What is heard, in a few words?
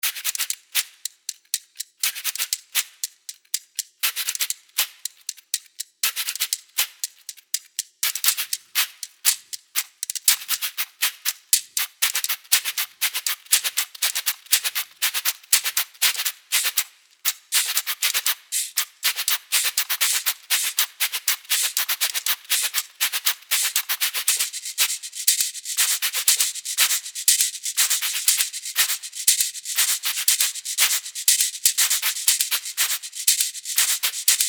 solo